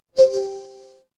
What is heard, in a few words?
feedback success